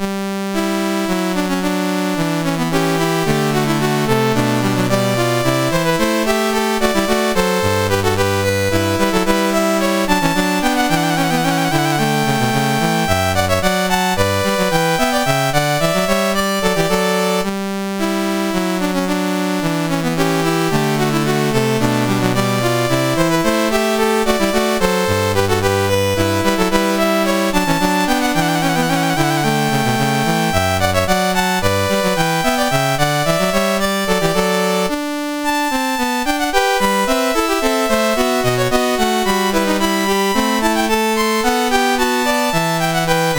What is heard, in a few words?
Variation, MOS6581R4; Goldberg Variations